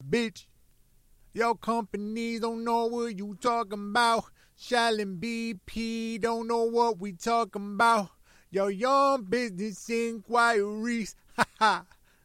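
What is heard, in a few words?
hip hop 120 Bbm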